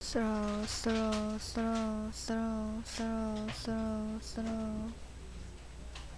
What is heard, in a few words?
throw